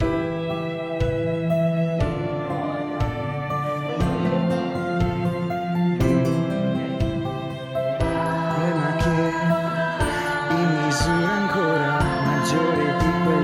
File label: song